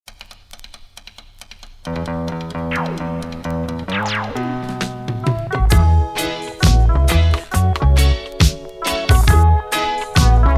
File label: Banjo Mix